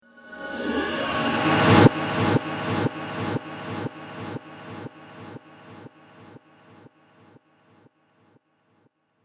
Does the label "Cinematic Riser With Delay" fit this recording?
Yes